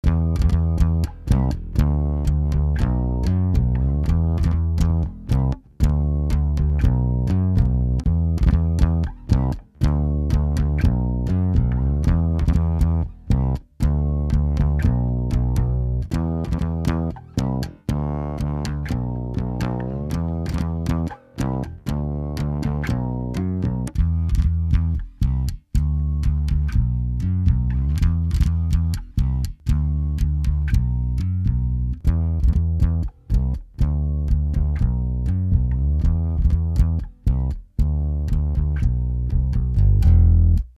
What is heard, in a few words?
Godin; Low 1 bis 5 sonst linear